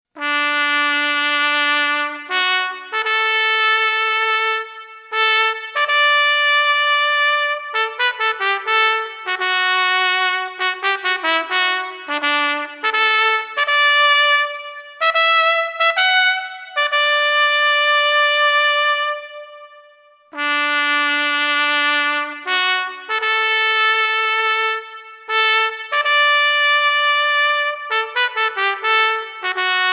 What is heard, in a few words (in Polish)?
hejnal Stargardu